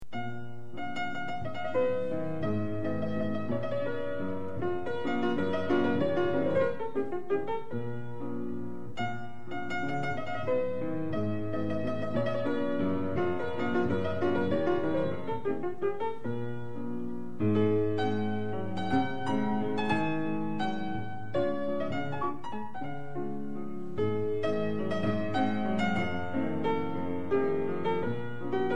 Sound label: folk dance B flat minor